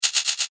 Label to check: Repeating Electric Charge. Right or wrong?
Right